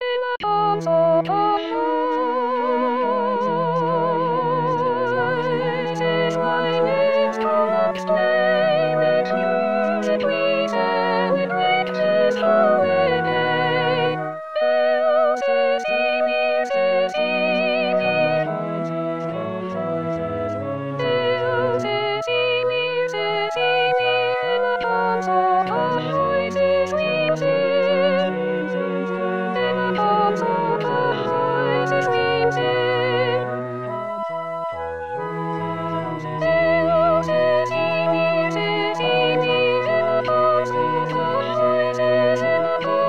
soprano, baroque; 2022 baroque

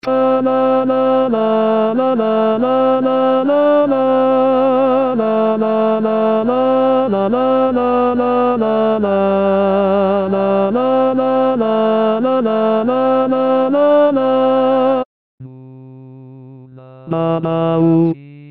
tenor; contrepoint; 2016 st jean

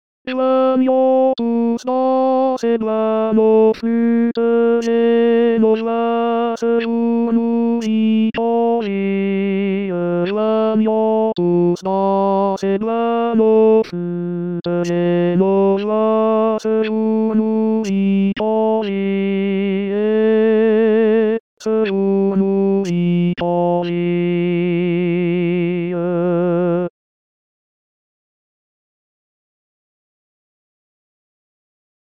tenor; joignons flutes sib

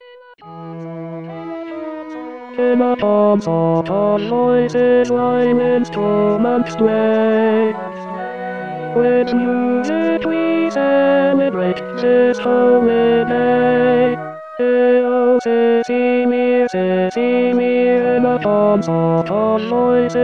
tenor, baroque; 2022 baroque

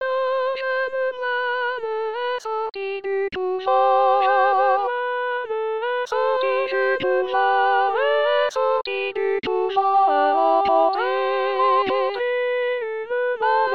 tutti

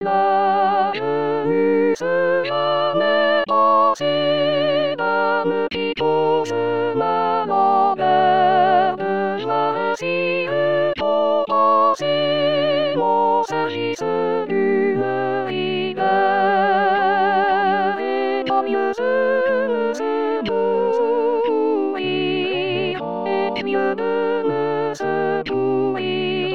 soprano

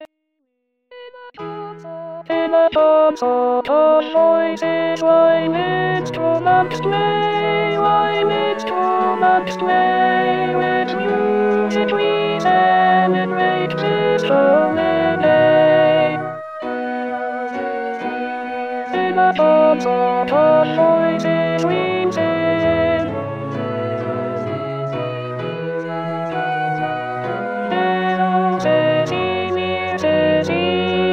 alto; baroque

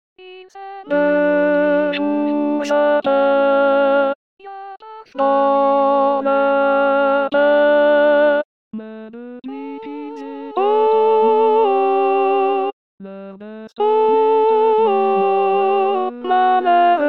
alto

